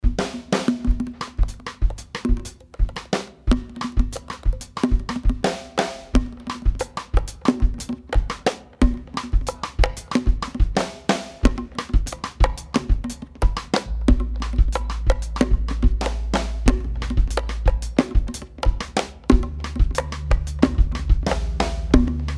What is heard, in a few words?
Short Percussive Jam